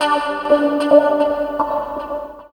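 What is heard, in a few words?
guitar, left; East West - Phat & Phunky CD2; 90's Sample CDs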